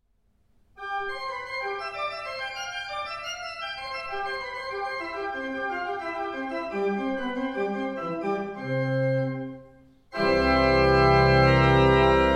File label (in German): praeludium c dur, orgeln; krieger; grimmelfingen